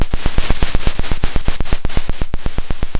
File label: pop, Sound of a drum roll